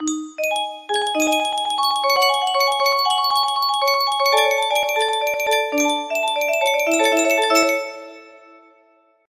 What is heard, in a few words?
music box, music box melody